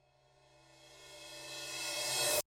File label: Suspenseful Reverse Cymbal; bricks in motion sound library